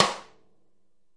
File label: DW Map Sn, Real Kit; REAL LIVE KITS